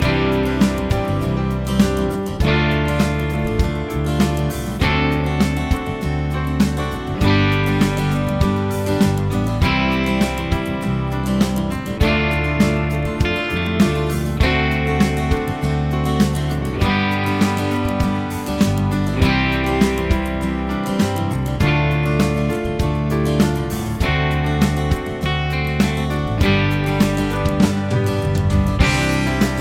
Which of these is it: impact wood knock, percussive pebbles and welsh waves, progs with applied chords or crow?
progs with applied chords